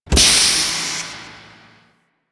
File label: Large Door Steam Burst; Warframe; Ambience; Corpus Gas City; Gameplay Remaster